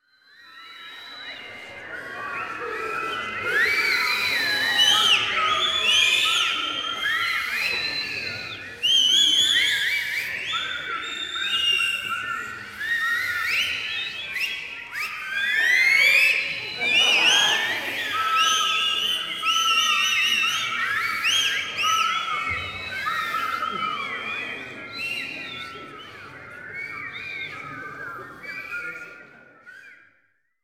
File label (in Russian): Svistyat bolelschiki